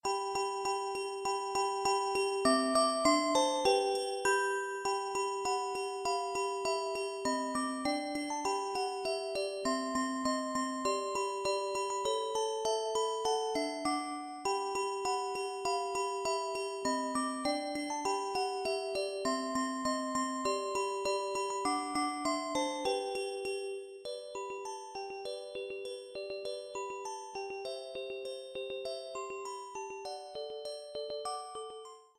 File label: Jingle bells